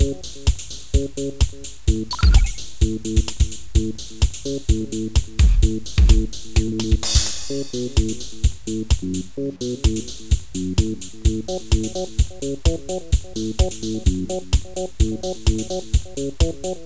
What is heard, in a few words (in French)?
Music de fond; Mobiclic